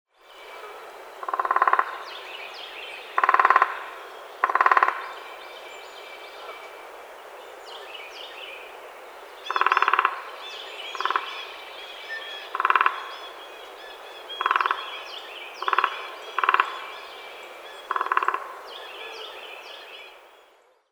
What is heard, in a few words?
Downy Woodpecker Dryobates pubescens